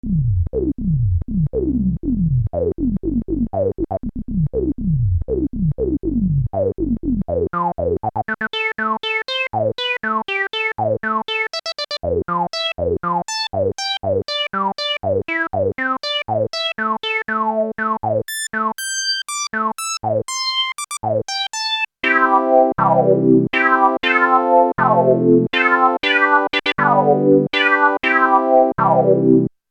Novation KS5 filter, lpf12 q100

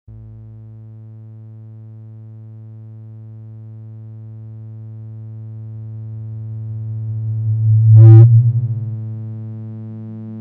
casio xw-p1 sounds, bpf max reso envelope; Casio XW-P1 HEX-Layer Synthesizer; BPF max Reso Slow Envelope

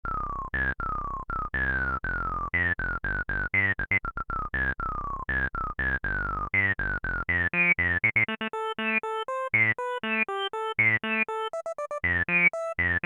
filter, rez 12 pole; e-mu virtuoso 2000

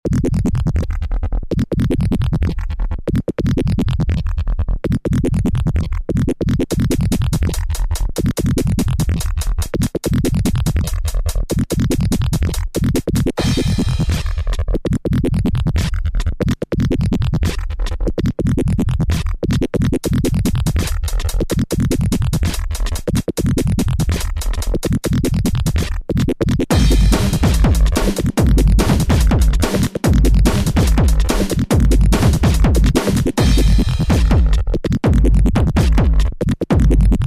casio vz-1 sounds, external drums

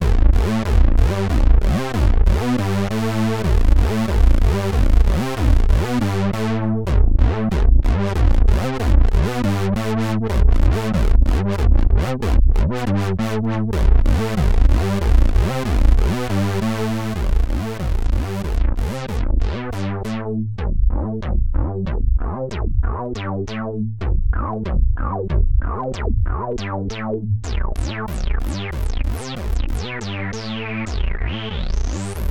roland juno-106 sounds, bass lead

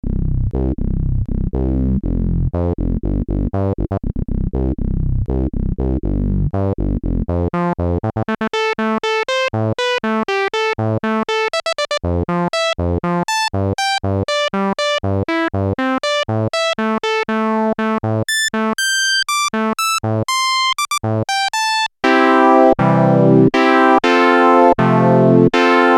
gem s3 turbo filter lpf lpf q025

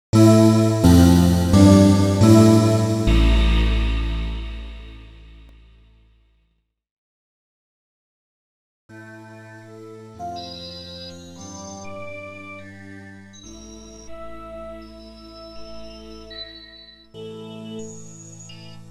yamaha ex5r sounds fdsp water choir raw and water

